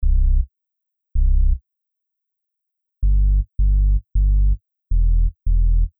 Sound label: Sub bass